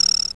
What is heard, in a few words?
Dragon Coin; SNES; SMALLSTARS